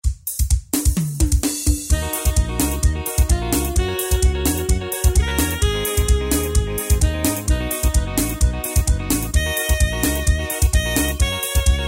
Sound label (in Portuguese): forro de vaquejada